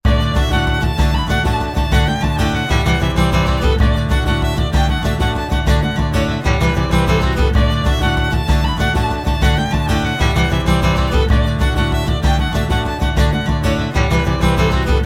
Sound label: Tradition irish; Adventure Xpress